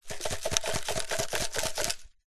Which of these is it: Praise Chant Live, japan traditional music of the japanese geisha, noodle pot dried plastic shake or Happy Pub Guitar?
noodle pot dried plastic shake